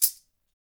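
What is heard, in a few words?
sistrum; Spectrasonics; Liquid Grooves; METALS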